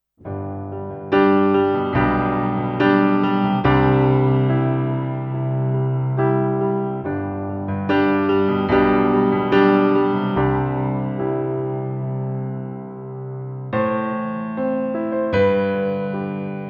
Studiologic Numa X GT Vintage Grand

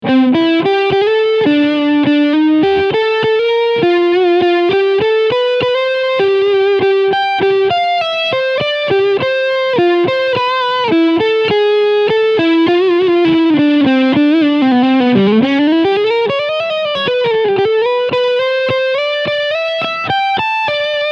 PRS SE Silver Sky Overdrive Middle PU

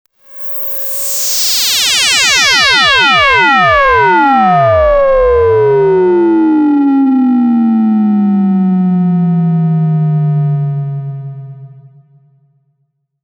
Filter FM

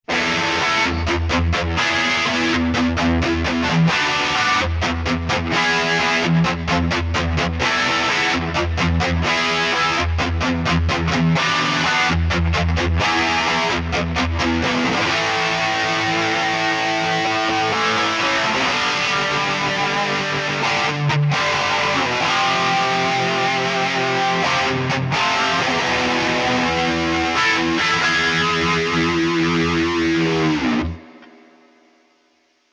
Mesa Boogie Comp Mod Midgain Riffs; Neural DSP

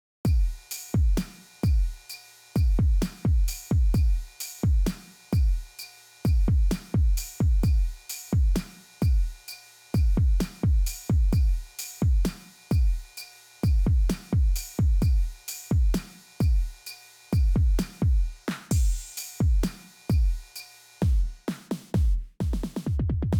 Electronic; MDrummer

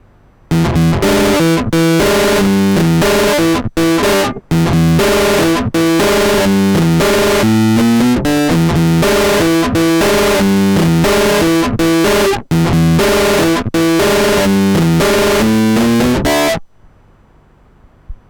MOD Devices MOD DUO X Epiano MOD BigM

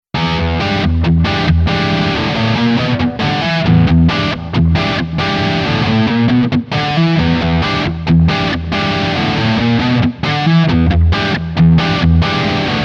Epiphone Les Paul 60s Neck PU Highgain Rhythm

